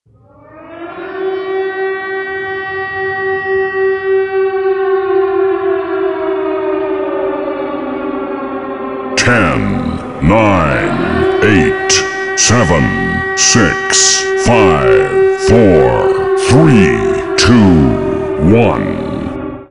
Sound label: sirena; cstrike; bluezone; zombie